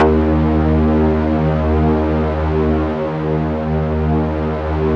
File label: D#2, Yamaha VP-1; Music Store